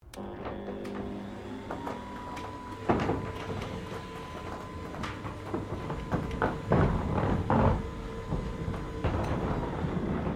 Door Roller Aluminium Garage Electronic Raise; Various doors; Miscellaneous Doors

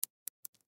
Pill Drop Bounce, Pill Drop Bounce Cement; Drops; Foley; Objects; Blastwave

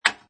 Pinball Sound Sharp Clank; Video Games

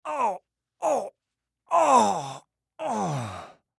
Human Groan Pain, Groan Painful Male Fifty Yrs; Groan Painful Multiple Male Fifty Yrs; Voice; Blastwave; Humans

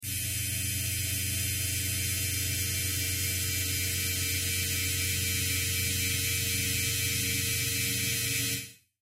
Sci Fi Laser Beam Ray Stream; Laser Beam Ray Stream Short On Off; Lasers

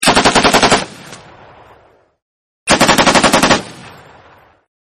M249 saw Burst, M249 Saw Machine Gun Burst Firing; Machine Guns

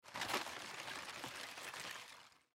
Ice Cup Shake Fountain Drink Fast; Foley Ice Cup Shake Fountain Drink Fast Food Restaurant Movement; Blastwave

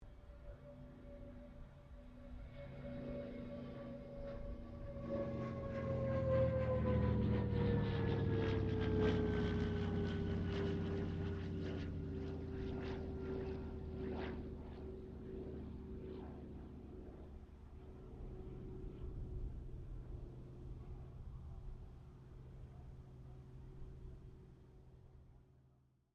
AIRCRAFT CA-13 BOOMERANG PASS; Airplanes; Vehicles; Aircraft Collection

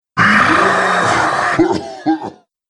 Dragon Roaring Then Coughs, monster

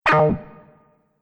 Multimedia Button

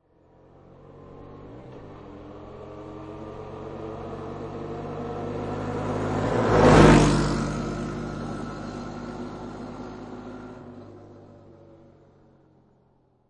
Quad Bike Drive By Left To Right; Motorbike, motorcycle type Quadbike

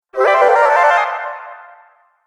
Amazing Magic Illusion; Magic Sound Effects

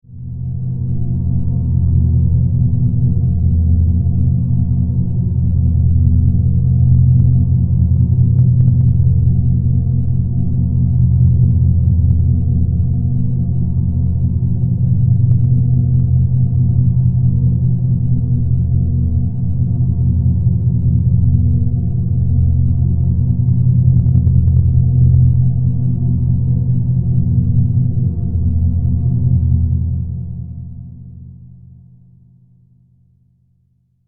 Spaceship, Spaceship: Ambience

